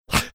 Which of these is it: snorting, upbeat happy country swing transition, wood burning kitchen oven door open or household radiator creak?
snorting